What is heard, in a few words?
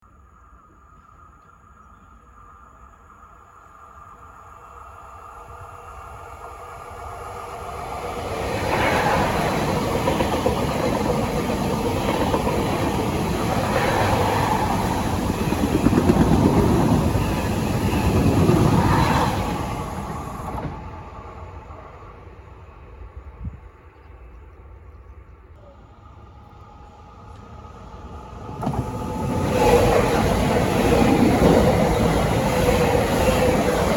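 train passes by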